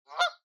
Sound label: canada goose